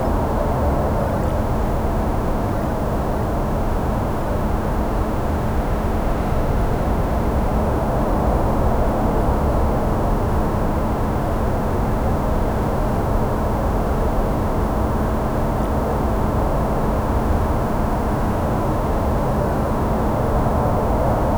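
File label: empty battlefield ambience, open field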